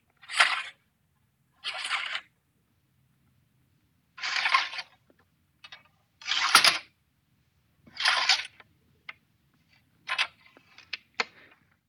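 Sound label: musket ramrod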